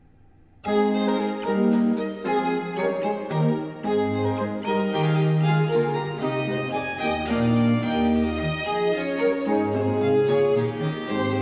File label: Sonate 3 in B-flat major - 4. Allegro; Arcangelo Corelli; Corelli - Complete Works; Klassika